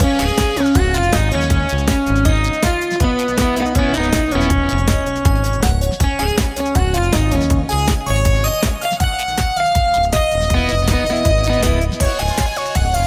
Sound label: Stadium Fast Loop; assault on metaltron ps4 switch windows xbox one gamerip 2019